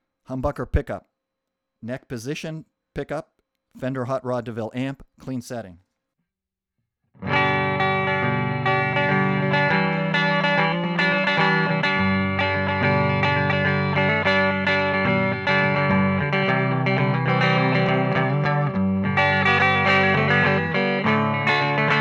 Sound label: hum neck hot clean